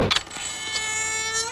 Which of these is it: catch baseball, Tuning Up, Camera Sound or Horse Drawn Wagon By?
Camera Sound